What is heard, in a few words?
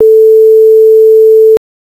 Sine wave